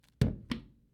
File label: Medium Thud